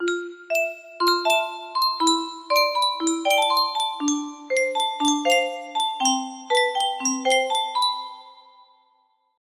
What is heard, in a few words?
electric piano